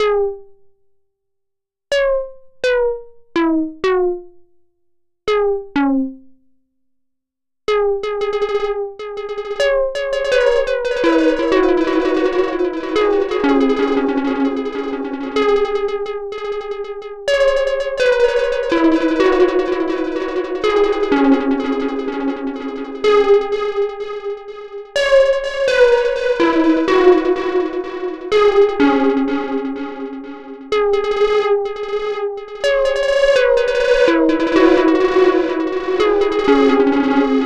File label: Dispersion Analog Synth